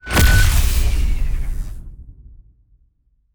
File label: level up end